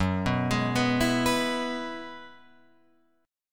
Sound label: Gb 9th Suspended 4th, Guitar, Open F; Gb9sus4; arpeggiated; JGuitar